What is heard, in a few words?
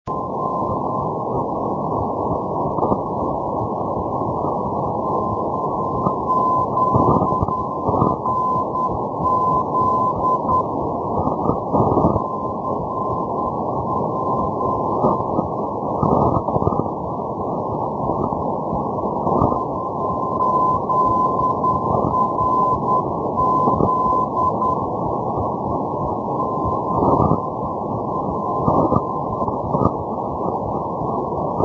ndb + ndb; zaragoza zrz-389; por-660 ndb lisboa-caparica cp-389; madrid; europe